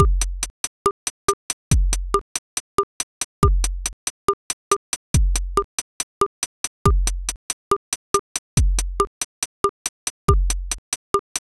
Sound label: bossa nova pattern